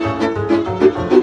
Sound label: VIOLA GROOVE; Best Service; World Colours